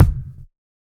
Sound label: Bassdrum; Ghosthack